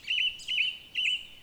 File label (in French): 3x bilip, Oiseaux